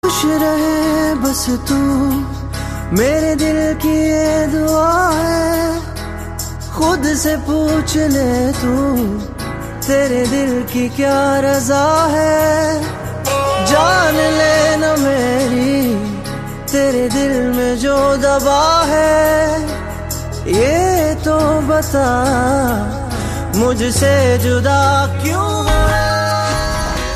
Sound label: sad ringtone